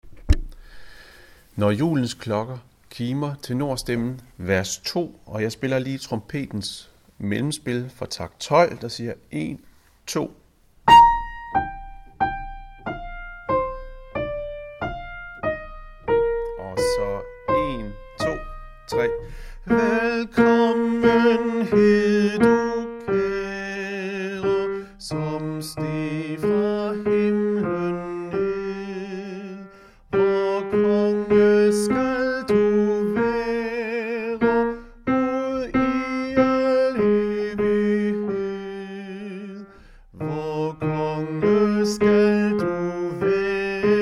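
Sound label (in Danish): tenor; Når julens klokker kimer 2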